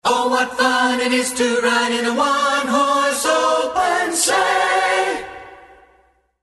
jingle bells

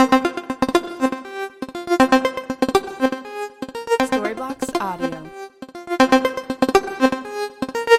Ambient House Lead 120BPM C

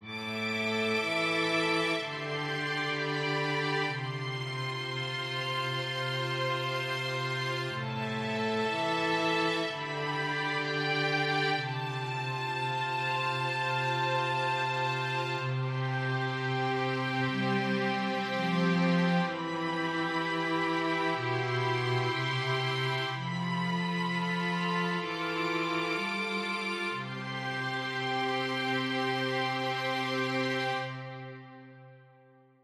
orchestral strings music cue

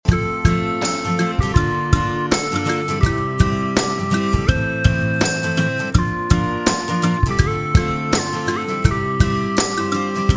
happy pop

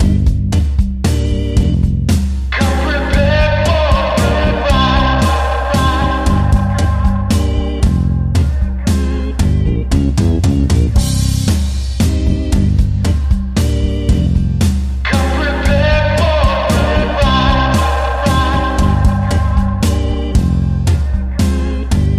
dark zombie night

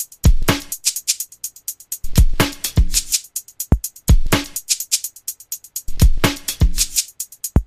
Ambient House Mixed Beat 125BPM

